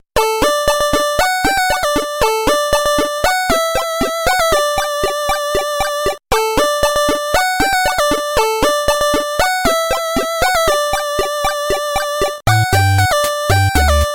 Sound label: 8bit Ghosties